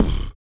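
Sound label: vox percussion, Vocal Planet Jazz FX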